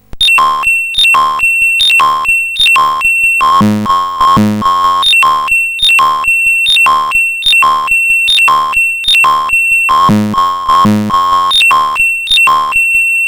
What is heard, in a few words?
Sync Bass Line, CSG8580R5